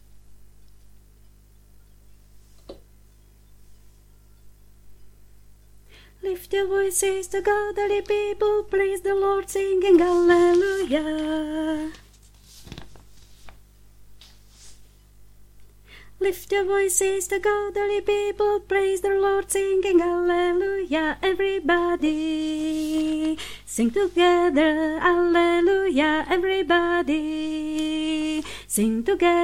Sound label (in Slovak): Africky zalm Sopran; Vianoce 2018 - Africký žalm